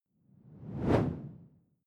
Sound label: whoosh simple low; swooshes-swishes